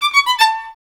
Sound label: STR VLN, Solo Instruments; Miroslav Vitous